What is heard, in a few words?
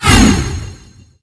high mage preload and travel